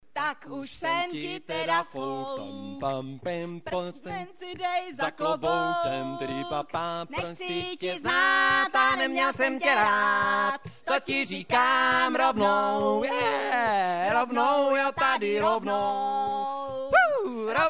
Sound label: Country